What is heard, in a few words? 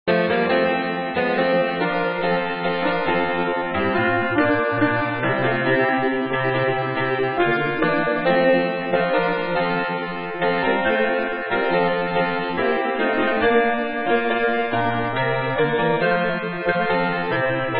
midi